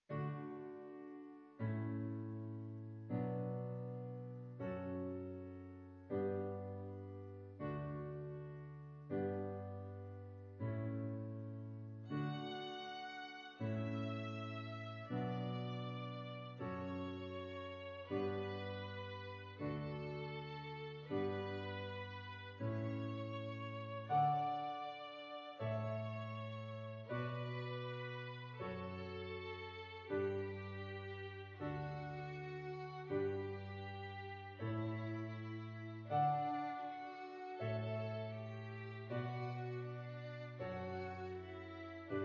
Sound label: Kanon, Violin and Piano